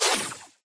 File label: imp bullet generic titanshield; Titanfall 2